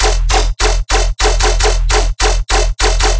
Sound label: bass loop 150 D#; vikings; Virtual Riot